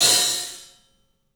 club hat; 3D Sound; 3D Drums

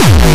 Distortion Kicks, Bassdrums

